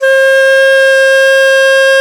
DIZI, Winds

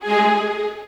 STRINGS; Houseworx

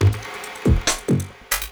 LOOP, 140 BPM, Drum & Bass

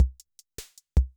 slow rock, Yamaha MR-10

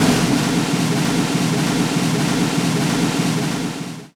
movie, impacts & hits; Techno Trance Essentials

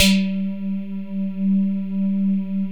BOUNCE; Masterbits; Vintage Voltage; SQ+FX

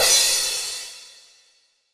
909 crash; Goldbaby Tape808 & Tape909; The Tape 909

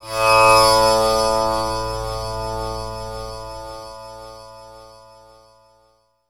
TAMPURA; Spectrasonics; Heart of Asia

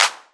808 Hand Clap, Drum Machines Roland R-5

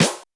U220 FX Set, Drum Machines; Roland U220

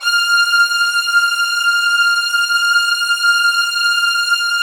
F6 SUS, LOUD VIOLINS; Q Up Arts; Denny Jaeger Master Violin Library

